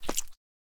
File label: step; minecraft; block